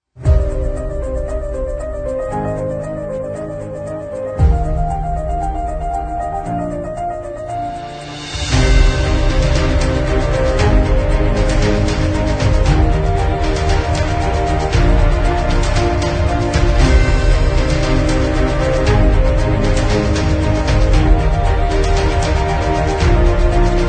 Epic Corporate, 美丽的钢琴项目与强大的管弦乐环境弦乐，铜管乐器，木管乐器，钢琴和打击乐器。 史诗般的情绪。; 史诗公司